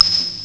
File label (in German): Geschirr; ST-XX Amiga Soundtracker Sample Packs